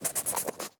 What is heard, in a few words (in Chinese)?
狐狸嗅闻声